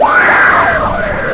disto fx, big beat; Big Beat Samples & More; AKAI; DISTOFX KIT

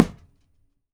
PUNCH, FIGHT; AKAI S-Series CD-ROM Sound Library VOL-6

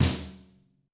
Blaaaghf, Yamaha DTXpress-II snare

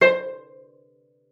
C5, CELLO PIZZ; Xsample VOL-5

